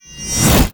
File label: Machete Finisher Accent Whoosh; Warframe; The New War; Kahl; Weapons